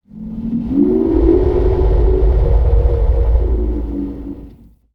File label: Troom Whistle